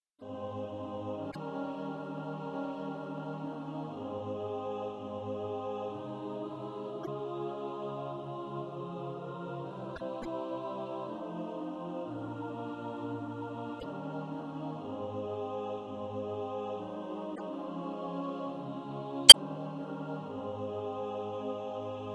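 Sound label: alto; Jesus calls us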